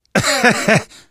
laughter, survivor voice; source engine; left 4 dead 2; manager

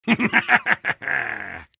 laugh evil; soldier; source engine; tf

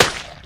miss; video game; source engine; left 4 dead 2; spitter

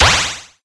space empty recycle bin; windows 9x; sound schemes

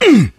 melee swing, player survivor voice; source engine; left 4 dead 2

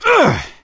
melee swing, gambler, survivor voice

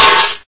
metal, debris; Source Engine; bshift

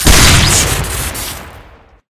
scatter gun double shoot crit; tf

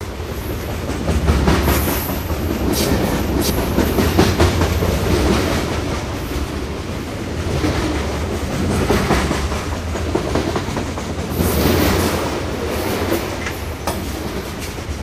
outside track, train; video games; source engine; portal2

